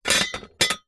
impact metal object bracket plate set down hard surface